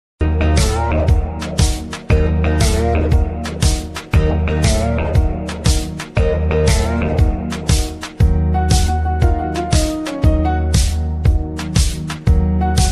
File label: bgm instrumental love ringtone love bgm